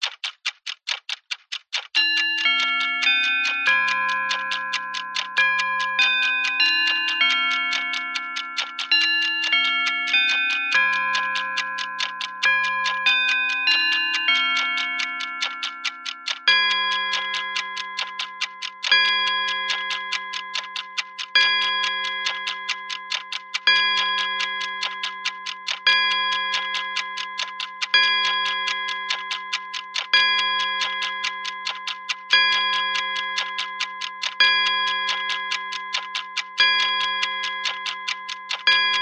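clock mantle tick tock strike 12 bell westminster chime; zapsplat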